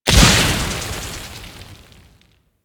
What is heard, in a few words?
Spike Slam Ability Burst; Enemies; Warframe; Infested; ZealoidPrelate